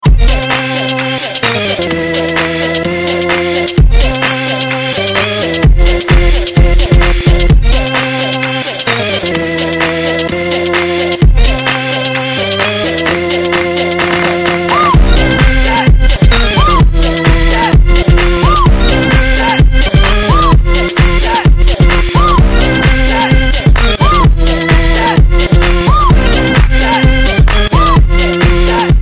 Bassss Beats

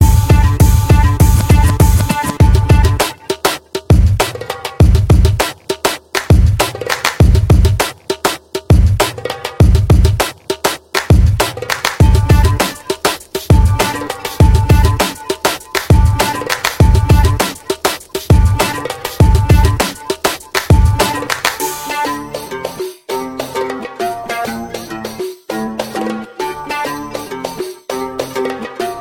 Best Arab Ringtone

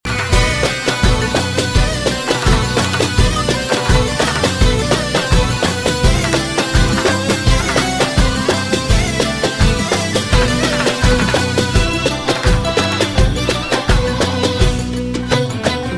Very Sad Very Nice

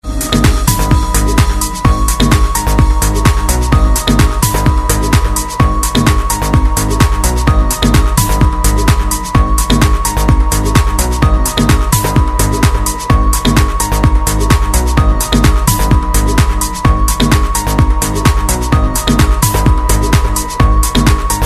New Tone

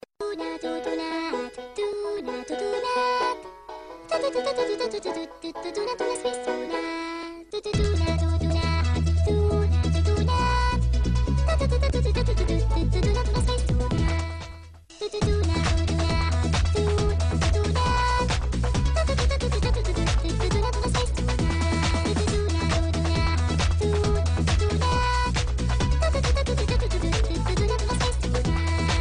Arab

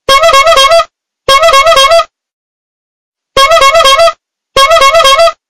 Funny Horn

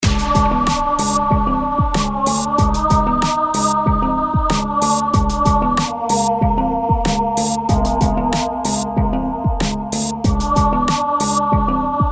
Hip Hop

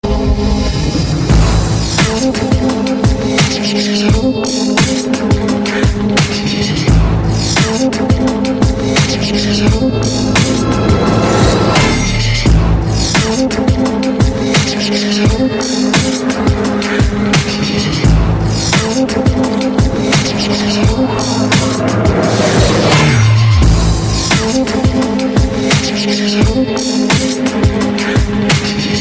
Power Bass

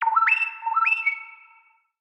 Dawn song

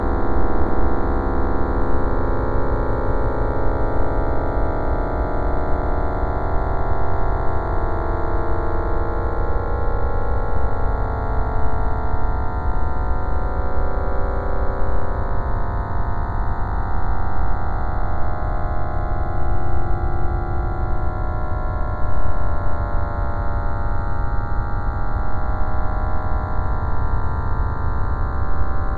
Crazy Scary Music

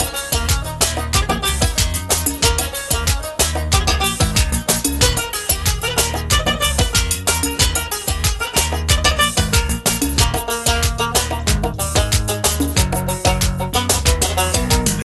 Arabic Music

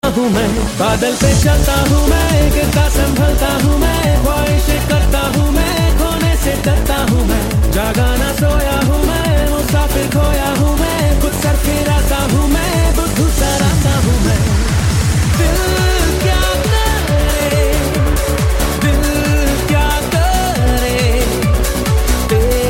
Cool Love Tone

